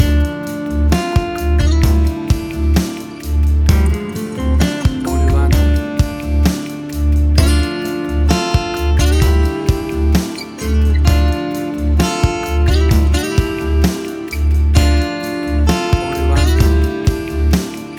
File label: Acoustic Guitar Tension; 60 SEC MIX